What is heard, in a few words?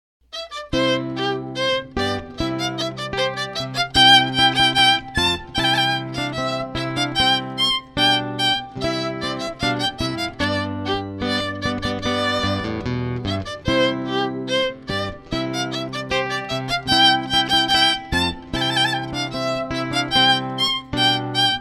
bach rondeau